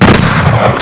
bang; ShootDesktop

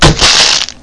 shot tree near; Bolo

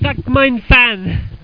huh; sextris

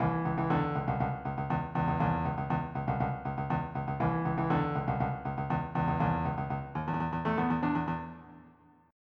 funk; Terra Sound Library

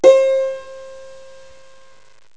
santoor, indian

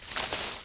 brush; The Sum of All Fears

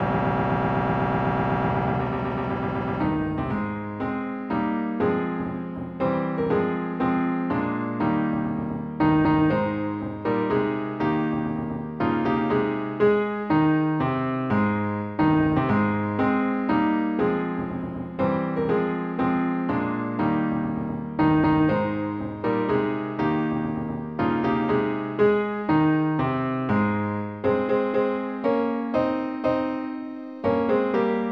anthem; 3800 MIDI Magic; classics

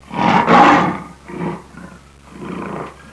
ROAR3, LIONS

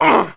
WELDER PUNCHED; Messiah

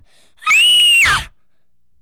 l scream

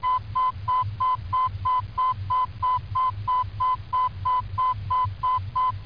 ALARM

